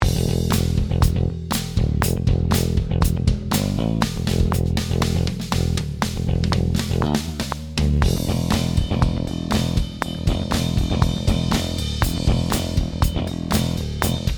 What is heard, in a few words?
Rock Bass DI